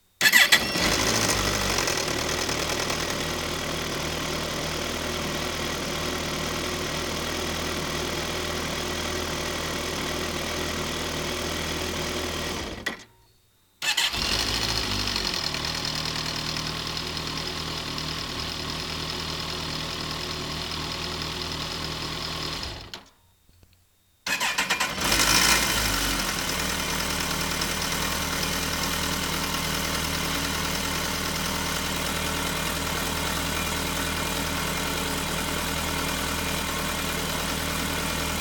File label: start up noise, ZX-14, engine clack noise